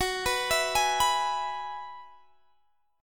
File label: F sharp 7th Suspended 2nd Suspended 4th F sharp down Guitar Standard; F#7sus2sus4 arpeggiated